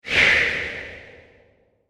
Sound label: Whoosh Fast Reverb; Production Element; Whooshes (Sound Effects:Sound Design); SFX Bible 6